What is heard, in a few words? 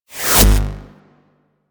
UI Exit; DE; Warframe